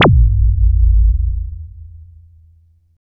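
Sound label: FX right, attacks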